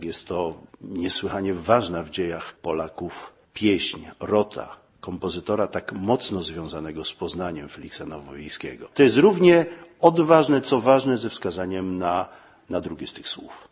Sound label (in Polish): jingle komentuje